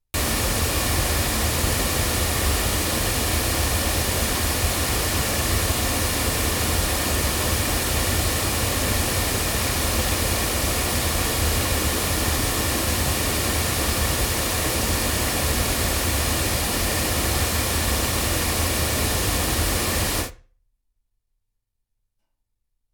Rode NTK loudspeakers

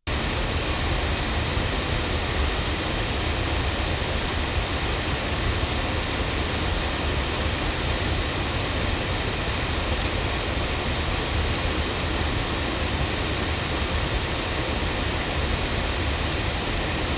MT97 8 loudspeakers; TSM

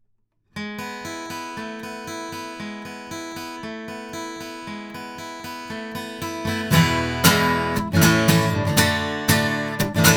guitar; BLUE Babybottle

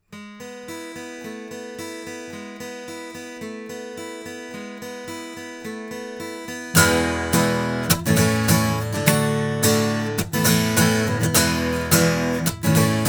guitar

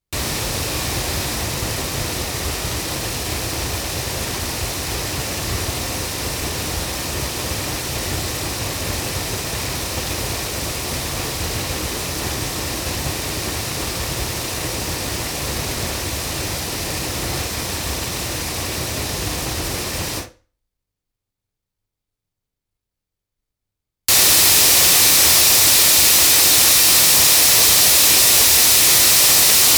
ADK A51ST loudspeakers, microphones recordings

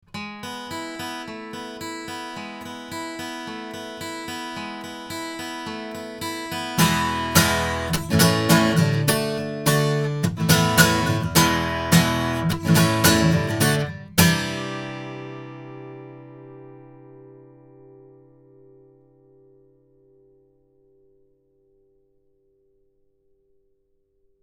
Rode NTK guitar

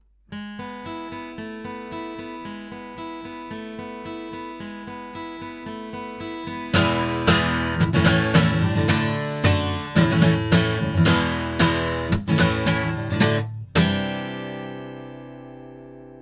sE 2A hypercardioid guitar, microphones; se electronics

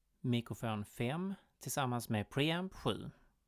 mic pre

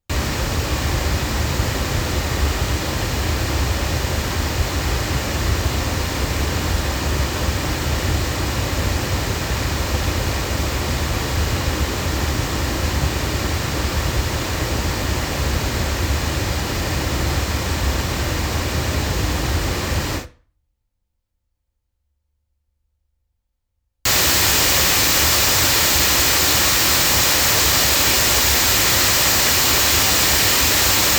Sontronics Sigma loudspeakers

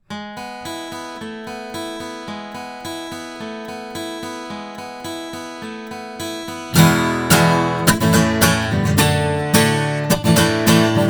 guitar; Pearl ELM-C; microphones

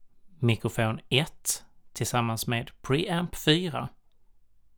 mic pre

